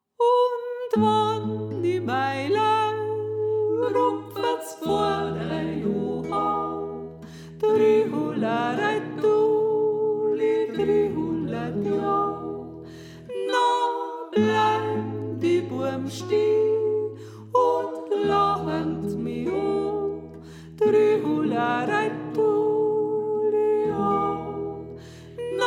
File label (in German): Sopran; Und Wann I Mei Leirupfas Pfoadei